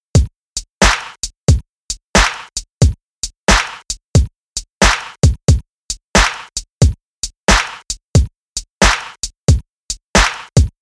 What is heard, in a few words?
Golden Ratio Swing 62.5